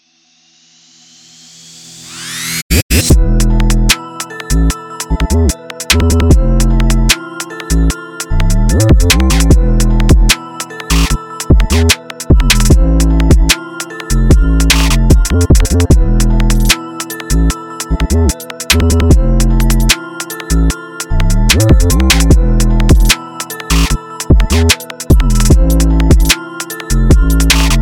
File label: Drill Beat